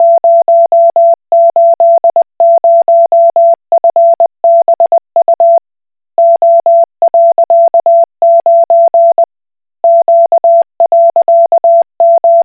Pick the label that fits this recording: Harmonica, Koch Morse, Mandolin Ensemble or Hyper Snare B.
Koch Morse